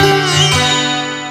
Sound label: Sitar